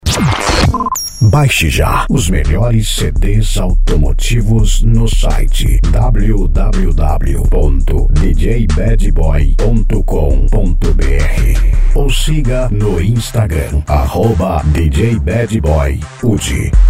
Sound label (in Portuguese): funk conciente e hip hop nacional